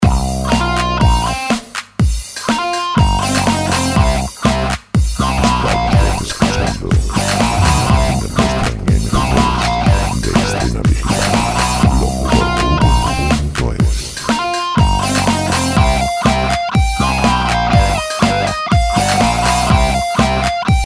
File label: rock; rock sin copyright